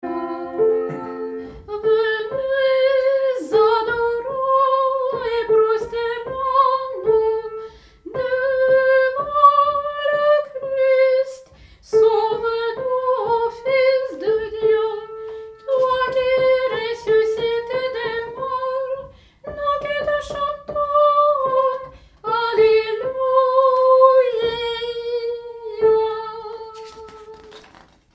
Venez adorons Tenor

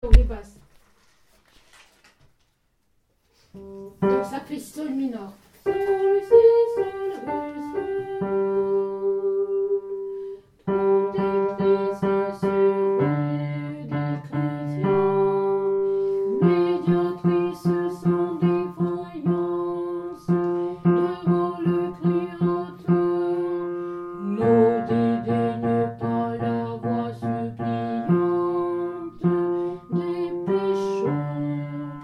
Protectrice des chretiens basse

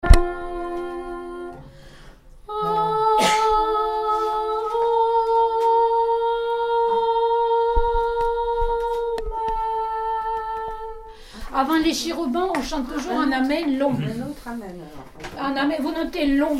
Amen femme

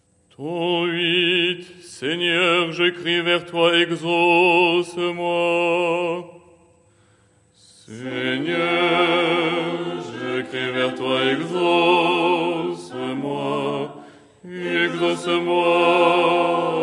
Ton8 stichere lucernaire basse

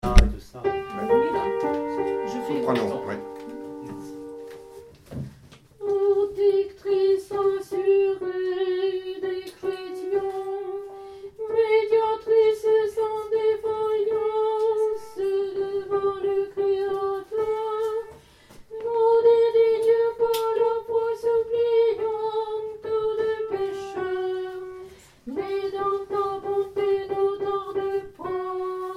Protectrice des chretiens Soprano

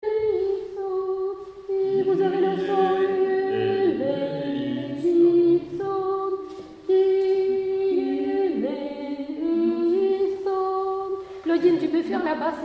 Kyrie tous

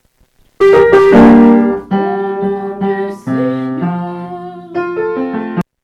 Au Nom du Seigneur Basse

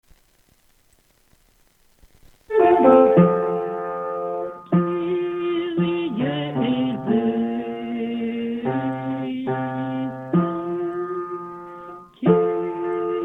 Kyrie basse

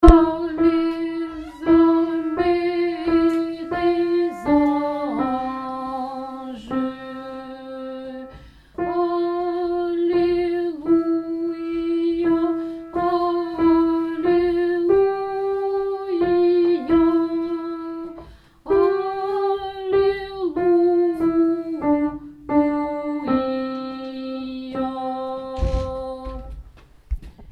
Cherub Alto; Borniant

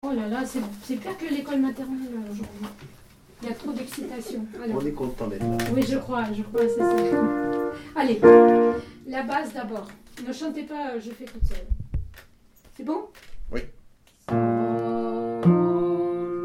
Trisagion basse

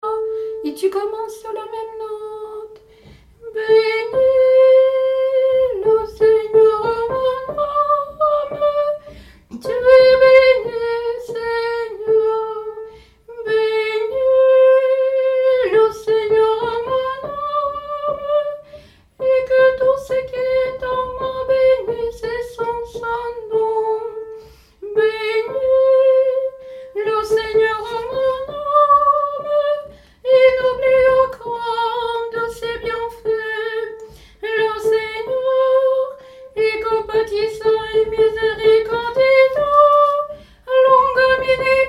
Benis Seigneur Tenor

